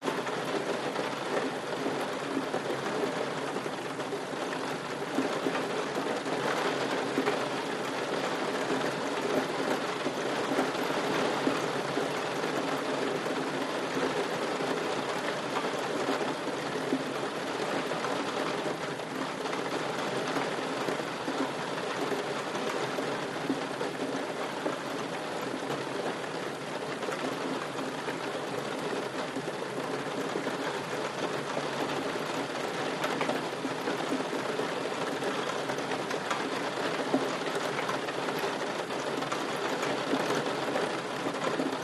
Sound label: RAIN Interior Loft Rain Against Window Light Steady Close Perspective